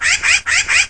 passaro; GEOGRAFIA

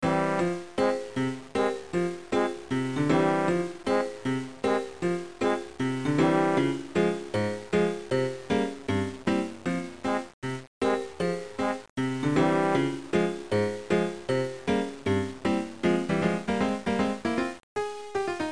trilha; GEOGRAFIA

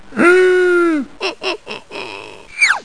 Vaca; GEOGRAFIA

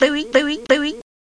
Burro; GEOGRAFIA

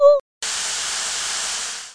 rega; GEOGRAFIA

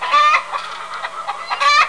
pato; GEOGRAFIA

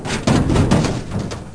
caindo; GEOGRAFIA

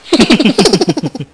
RIZADAS; 7invasores

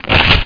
RASGO; 7invasores

